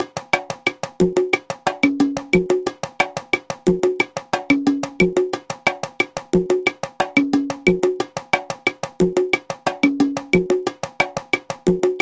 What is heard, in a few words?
son montuno